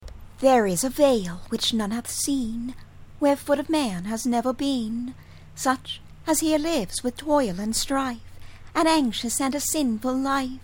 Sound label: aeolian harp